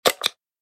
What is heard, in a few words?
wheel start; Fruit Fiesta; Wazdan